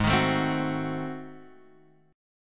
Ab 7th Flat 9th down Guitar Open G

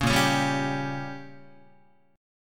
Bb Minor 6th Bb down Guitar; strummed; JGuitar

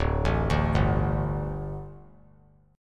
D Suspended 4th D; arpeggiated

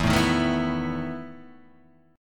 E Major 7th Suspended 4th down; strummed; JGuitar

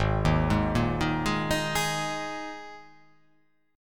G sharp Suspended 2nd chord, down

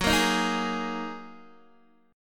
Gb 6th Add 9th Gb down Guitar DADGAD

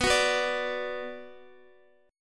B Minor B Guitar; strummed; JGuitar

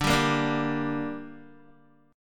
D Suspended 4th Sharp 5th, Guitar; strummed; 4F,4A,5C,5G,5C,6E; 9,10,10,7,7,x

